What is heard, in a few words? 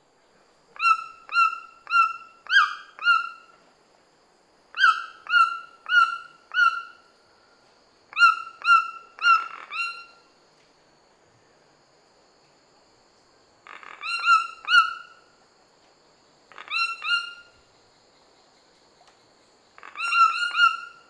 Ramphastos tucanus, duet and bill claps; S. America; Eastern Lowlands